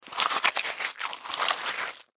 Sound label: Monster Bite Chew Teeth Squish Creature; Horror; Animal; Monster Sound Effects